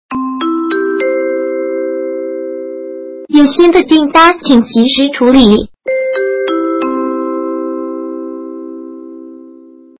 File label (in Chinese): 新订单提醒; android